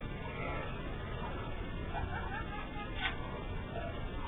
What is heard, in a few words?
laugh; tulsa